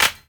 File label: clap one shot D key; percussion; single hits one shots